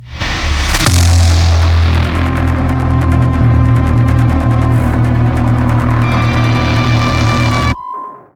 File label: transition; Doom; VEGA Core